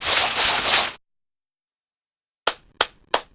chalk

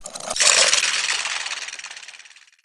windmill

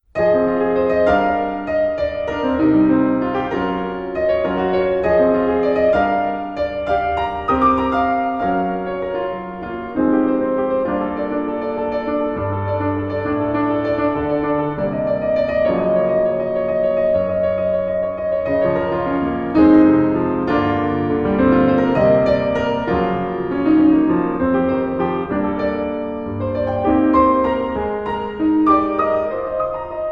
piano

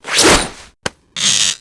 bow

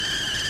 short boil; freude

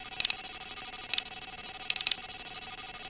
hacking; bobby

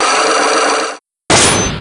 lock start; psyche lock

